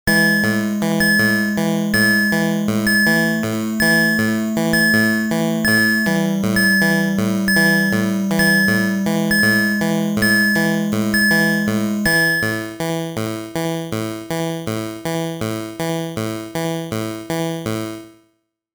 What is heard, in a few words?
Alert; Image Fight; gamerip Famicom; No Drill